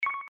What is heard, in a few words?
Pound, Key tone; KB620 Elbrus; LG; System sounds